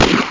handgun; thecitadel

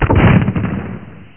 rpg fire; duke3d

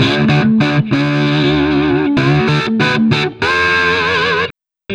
Electric Slide A; Sonic Foundry ACID Music

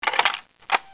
Buttons; earshot

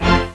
Major Orchestra; Jim Heid’s Sounds

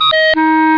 clarinet

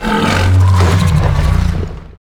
vox

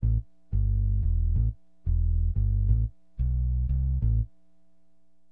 BASS, HipHop; LiveAct Pool

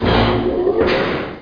spaceship

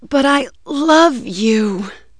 VX DIE; REDNECK; RAMPAGER

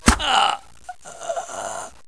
Ouch; Close Combat

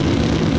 rev run, jetpack; Deus Ex Machina

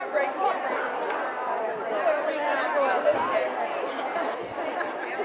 Ambi, indoor

